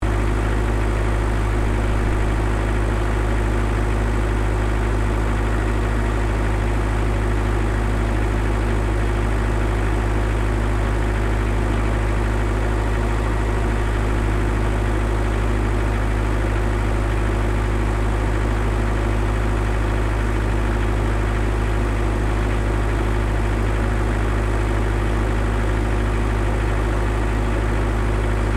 Generator Kubota GV1120 operating engine loop, Industrial generator; Machinery